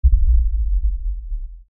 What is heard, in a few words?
LFE Tremor, Lfe Tremor Low Bass Shake Rumble